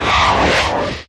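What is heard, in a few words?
Creature Alien Scream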